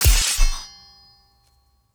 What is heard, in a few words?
Kill